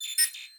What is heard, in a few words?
next target; War Thunder